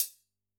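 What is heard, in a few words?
Closed Hat; drum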